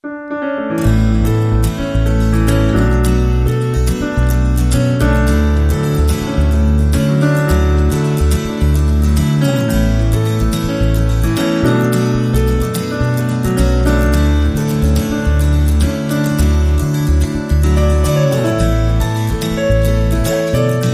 Easy, No Drums

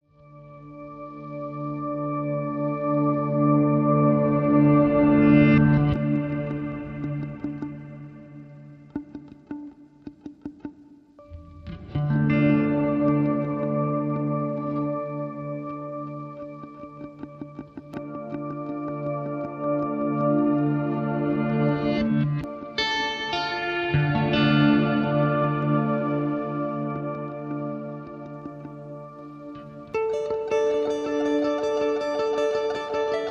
Guitars

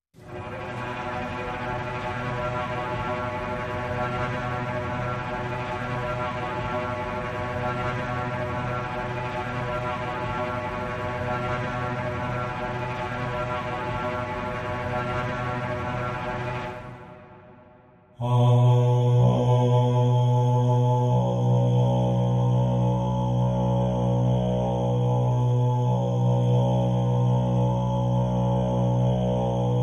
No Drums No Percussion No Risers

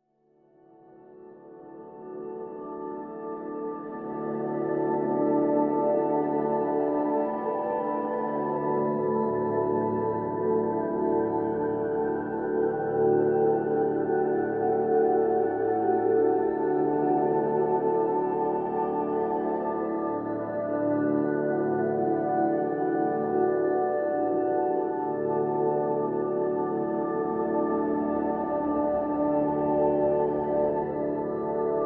Pads Celestial (D)

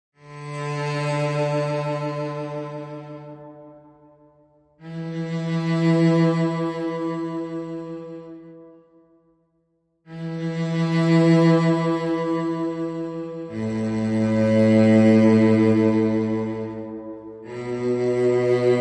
Lead Cellos Only

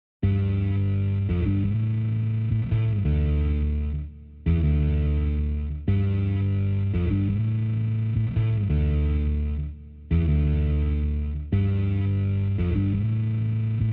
Bass and Guitars

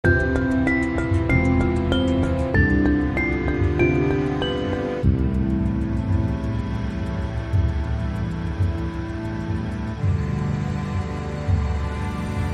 Thoughtful Marimba; Sting

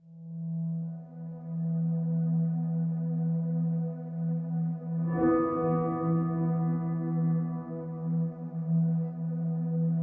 Piano Only

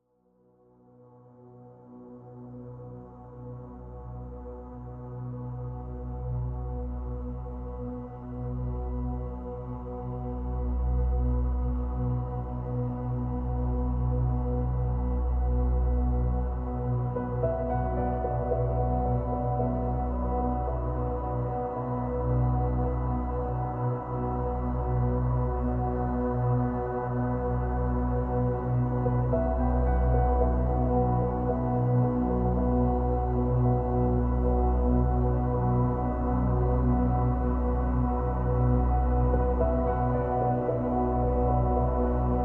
No Perc

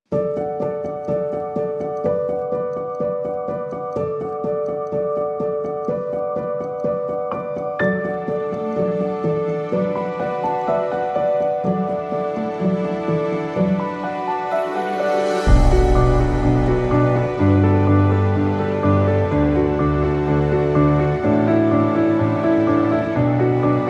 No Solo Strings

